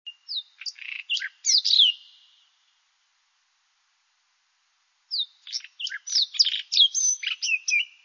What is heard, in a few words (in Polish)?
iranka - Irania gutturalis; ptak dotychczas w Polsce nieobserwowany; wroblowe; turdidae